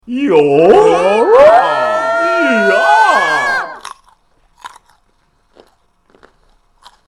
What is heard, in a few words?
yooo hoo